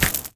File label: walk stone